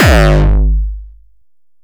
Dark Kick

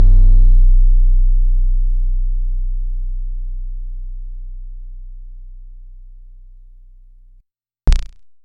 kicks, Bass Drum

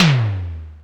Tom, Roland Digital Drum Brain DDR-30

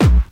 bombo, kicks, Hardcore

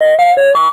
speak n spell, audioloops

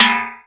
Metal, Roland R8, Percussion; Drum Samples

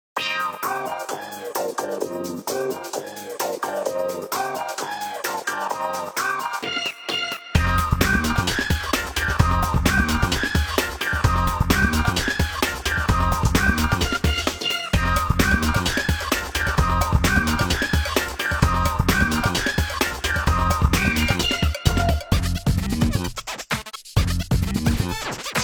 Disco, Ringtones; Nokia; N72; Disco charge

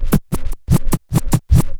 scra, Scratches; The Tables

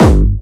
Jump, kicks

